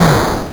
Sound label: explosion; arcade